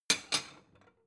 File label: plate small shelve slide